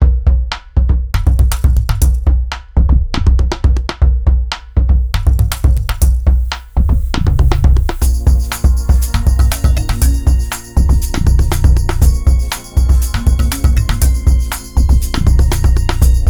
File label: Drum my groove